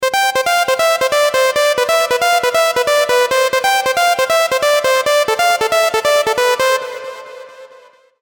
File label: ringtone; klad hest